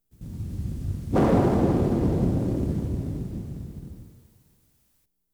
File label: explosive space launch